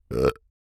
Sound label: burp; OPENAIR; Anechoic